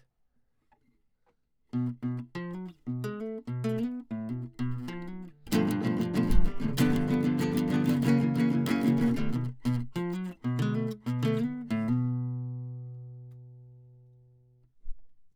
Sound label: Classic Guitar